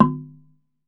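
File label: TUNG LO, Tongue Drums, Pitched Percussion; E-MU Producer Series Vol. 7 – Old World Instruments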